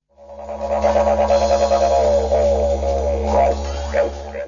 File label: texture didjer